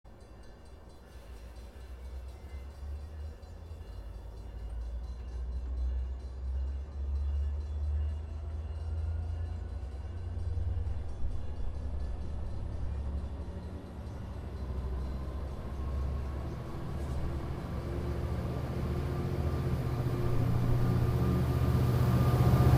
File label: Metro Station